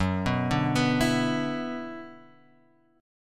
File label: F sharp 7th Suspended 4th Sharp 5th; F#7sus4#5 Chord {2 2 0 X 0 0} arpeggiated